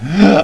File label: AHH